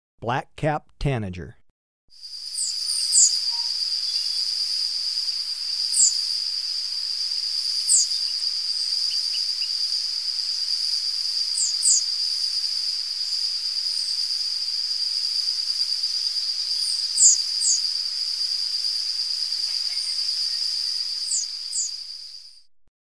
Black-capped Tanager, Birds of Ecuador; S. America

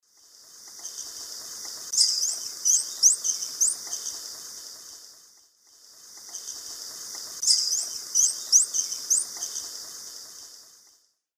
Anthracothorax nigricollis, flight call; S. America; Eastern Lowlands

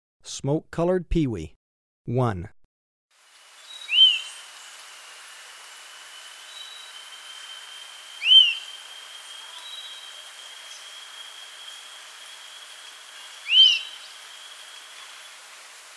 Smoke-colored Pewee, Birds of Ecuador; S. America

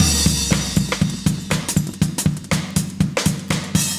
120, loops; Sound Effect Library

